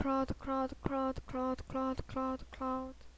crowd

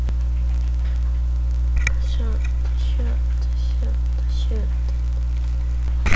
shoot

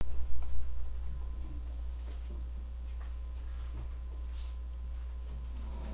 cough

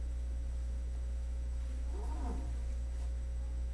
boar

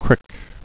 crick

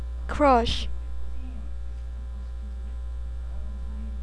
crush

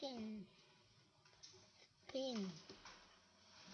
spin

